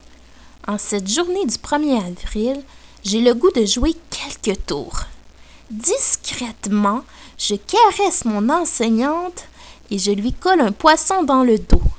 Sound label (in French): son printemps